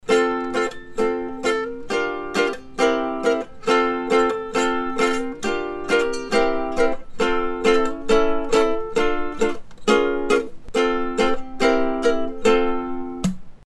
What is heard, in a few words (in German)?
t.bone SCT2000 Ukulele Kugel